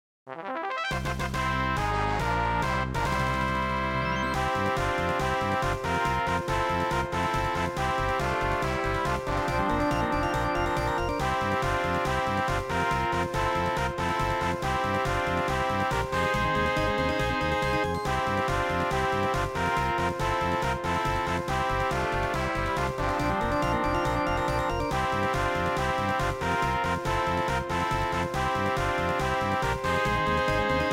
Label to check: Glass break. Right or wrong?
Wrong